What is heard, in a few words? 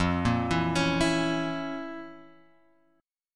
F Major 7th Suspended 4th Sharp 5th F; FM7sus4#5 Chord {1 1 2 X 2 0} arpeggiated